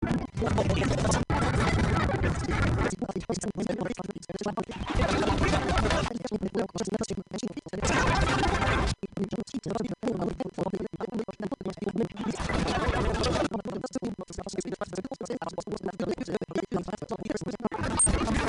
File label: Peace Drums